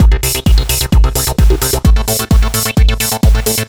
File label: bass loop